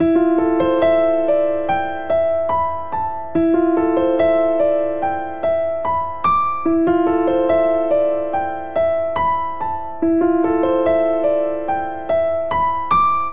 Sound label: Ostinato Modal E Phrygian 72 bpm, Grand Piano; MIDI Clips; Ableton